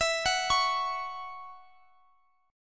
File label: C sharp Minor Double Flat 5th E, chord